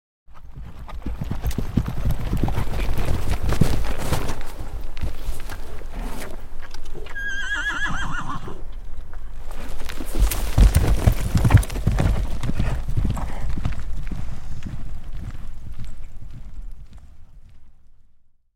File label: Single Horse Canters Up Stop; Historical; Hollywood Edge Historical Series; War Horses Armies & Weapons